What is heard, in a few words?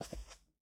Foot Carpet Shoes Walk; Lucius III